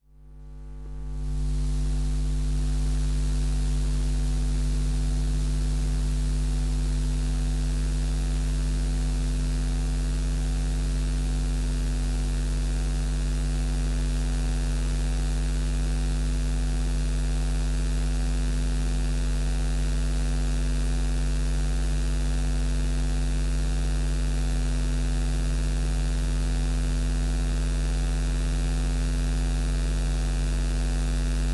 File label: emf dishwasher; sonniss gdc